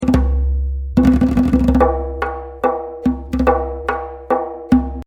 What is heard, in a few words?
6 Beat 72